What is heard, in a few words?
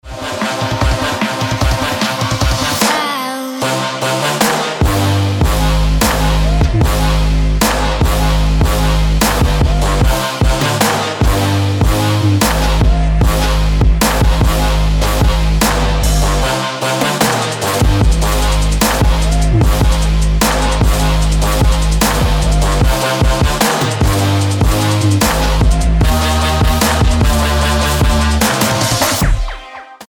Trap Ringtone